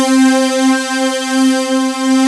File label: PAD Hot Pad C4; Best Service Gigapack 1